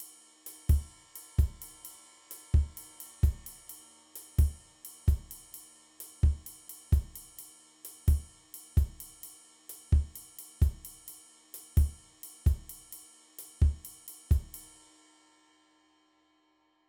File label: Salsa Beat